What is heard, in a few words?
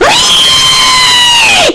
reee; garrysmod